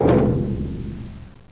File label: noisy oil drum; Weird and Interesting Hits; single hits